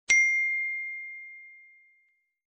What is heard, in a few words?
mi sms tone